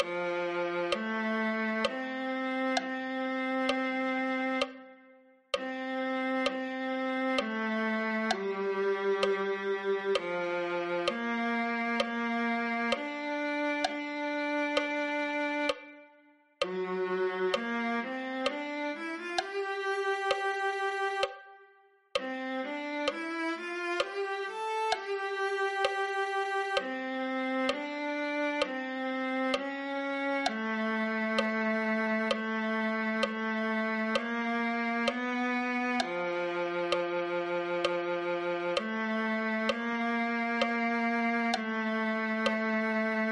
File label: viola